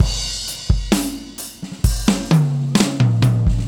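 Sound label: 65 bpm, beat loops; Zero-G; Monster Beats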